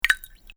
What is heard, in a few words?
Plop